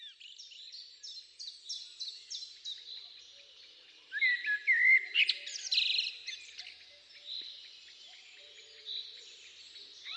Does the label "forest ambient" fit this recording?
Yes